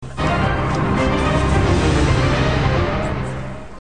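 Stab Sound That Plays On The TV Show When They Win; Eggheads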